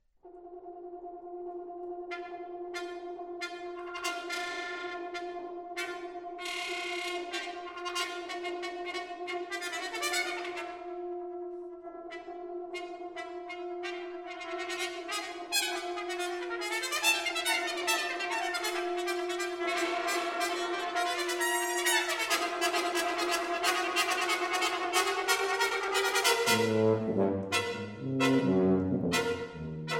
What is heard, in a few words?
Quintet for Brass Instruments; Atlantic Brass Quintet